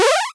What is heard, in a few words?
Unfreeze